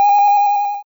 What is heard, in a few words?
ice brust snowflake